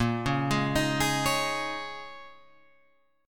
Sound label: Bb Minor 11th, down, Guitar, Open F; arpeggiated; JGuitar